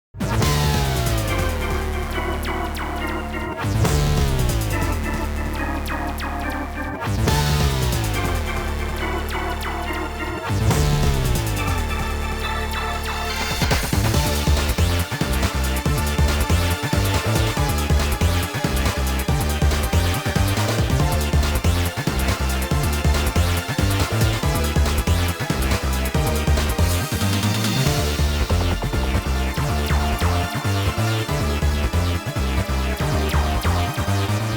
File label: BGM Music - Catastrophe; YuGiOh Over the Nexus